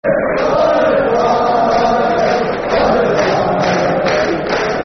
We Won The League Again [Football Chant (Soccer Songs)]